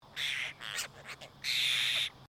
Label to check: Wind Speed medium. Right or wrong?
Wrong